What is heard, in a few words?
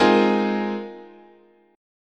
G sharp 6th Add 9th, chord, guitar